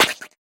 spew, infestation, bulb

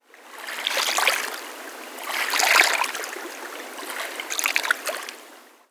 ambience canals water lap

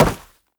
step run, footsteps, player, carpet

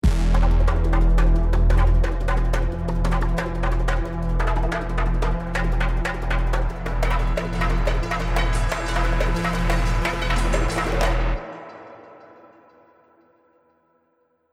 bomb ten sec count

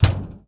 metal auto, player footsteps

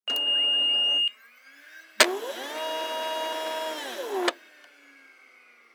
machine office, ambient; nuke

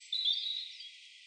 birds 1shot; animal

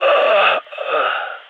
ct death; vo; gign

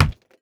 fist hit, flesh; physics

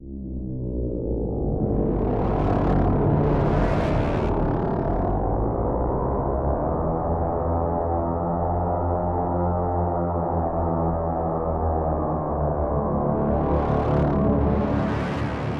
garage calm, music

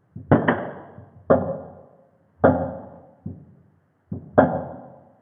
church; ambient; inferno

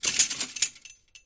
chainlink rattle; scripted sequences, a2

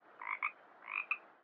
frog

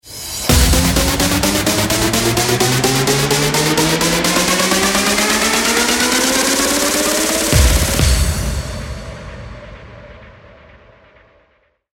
start action, music

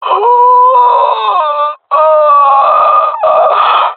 death, jungle male; player vo; Counter Strike Model Pack; CS:GO

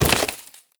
debris cardboard, bullet impact debris

